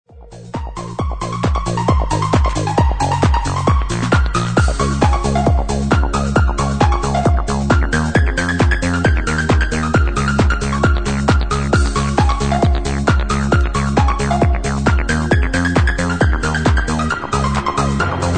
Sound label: microwave mix